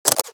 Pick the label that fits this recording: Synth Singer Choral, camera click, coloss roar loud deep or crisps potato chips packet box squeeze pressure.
camera click